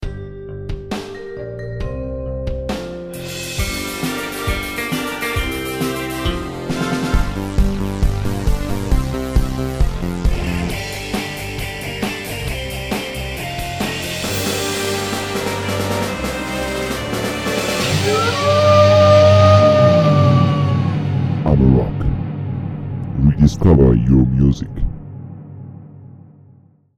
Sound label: Jingle; Amarok